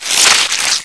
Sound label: web door break; ambient; Half Life 2; Episode 2; outland